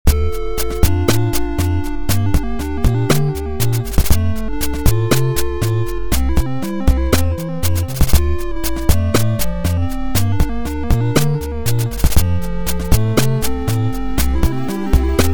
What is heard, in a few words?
song; Robot Wants Fishy